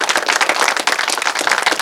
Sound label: Sfx Applause